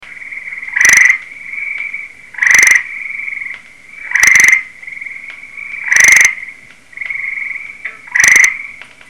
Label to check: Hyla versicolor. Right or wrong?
Right